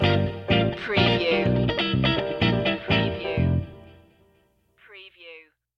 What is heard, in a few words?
street party stinger